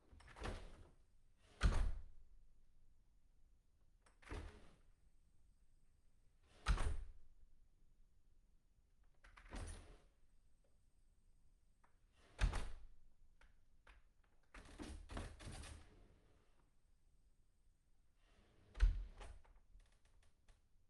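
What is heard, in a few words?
house door front open close far soft, interior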